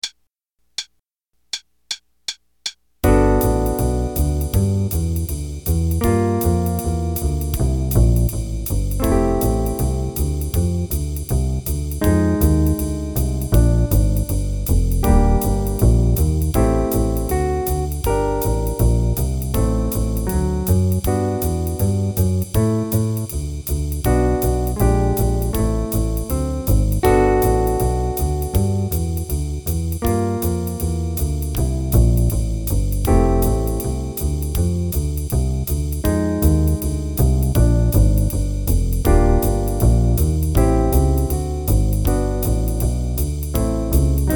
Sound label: Play a long, 3 choruses qn=160, Jazz Improv Play-a-Long